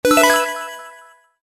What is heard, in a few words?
alarm item; Starbites